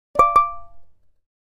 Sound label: Email, Nokia Alerts; Windows Phone apps